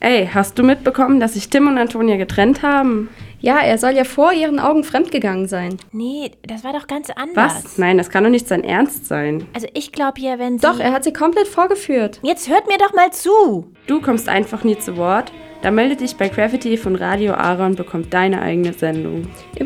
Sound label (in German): Jingle